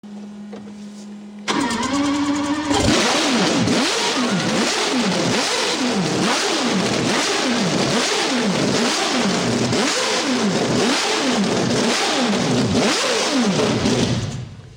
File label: sound mazda 787b